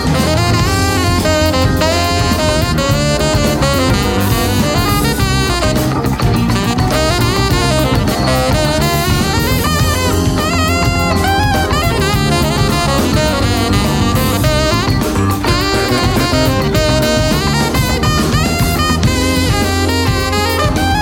Funk Jam tenor

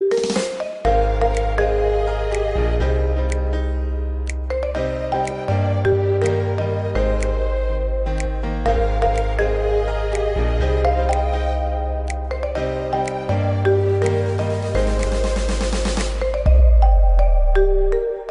ringtone; symphony

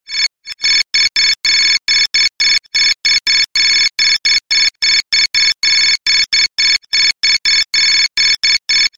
Alarm Clock

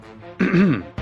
throat

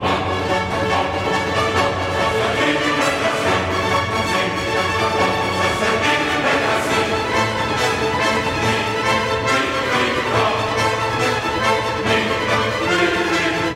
Tune; Kameo

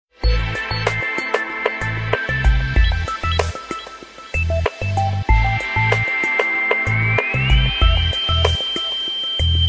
New Beats Sms

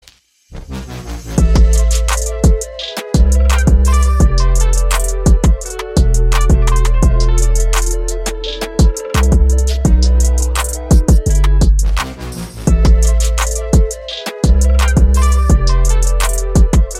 trap

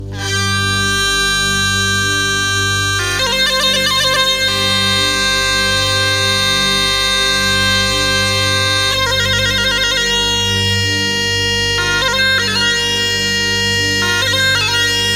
Tulum solo

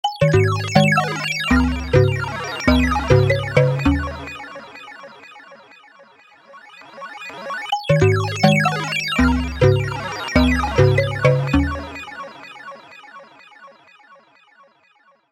Computer

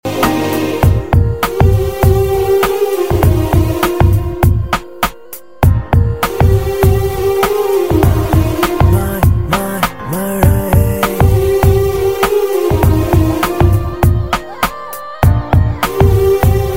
Soft Tone

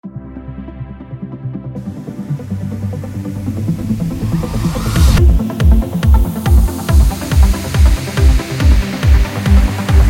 trance

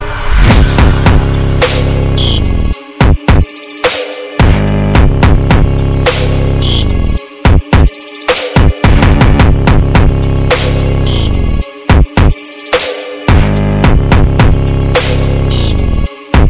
trap tone

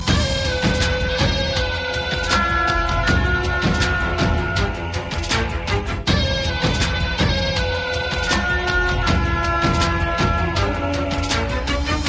Gloomy Beats

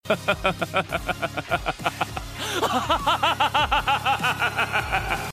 evil laugh; Ramuda

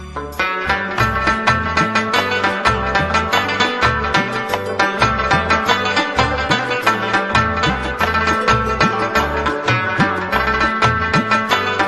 Pashto

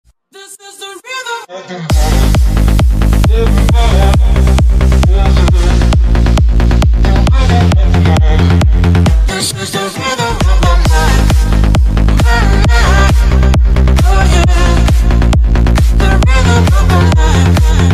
Rhythm Bounce; Corona

